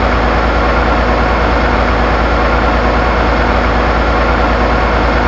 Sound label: BR120 sample